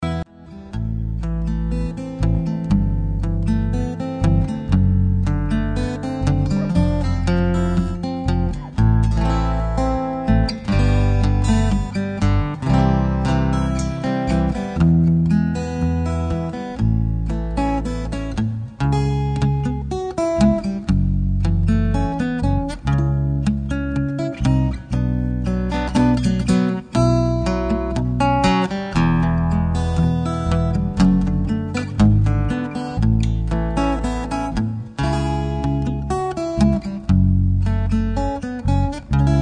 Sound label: guitar